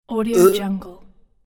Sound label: burp